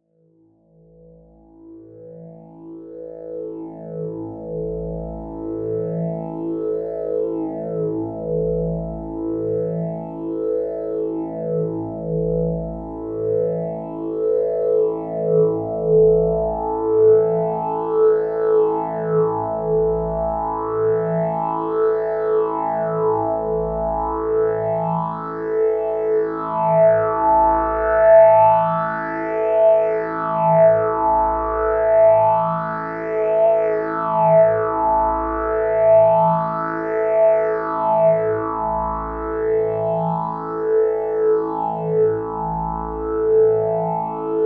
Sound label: ps3100 pad